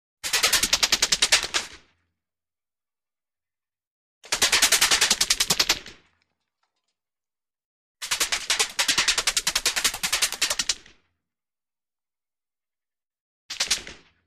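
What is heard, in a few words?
automatic rifle shooting at metal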